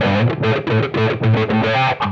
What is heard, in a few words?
Electric Guitar, Loops; Guitars